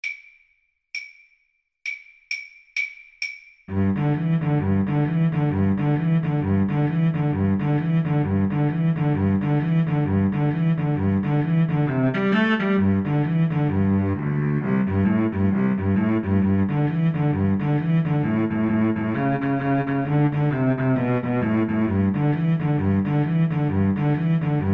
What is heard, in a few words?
jingle bells boogie violin cello duet